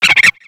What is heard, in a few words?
pansage; cries